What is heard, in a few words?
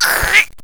death; faerie